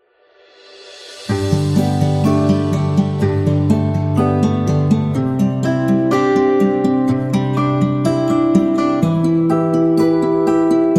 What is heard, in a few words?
Upbeat Acoustic